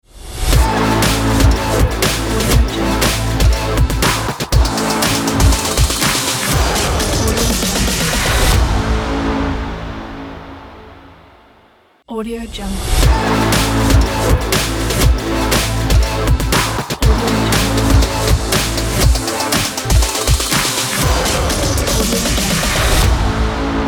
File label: breakbeat logo